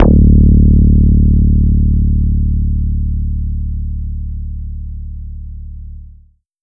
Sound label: disco, bass analog & synth; FRIGIDE; USB Soundscan vol.12; AKAI